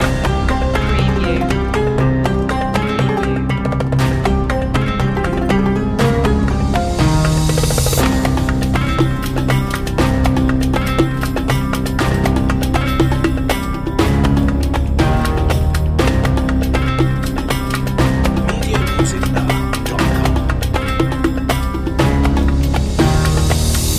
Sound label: eastern mystic loop